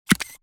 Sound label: screenshot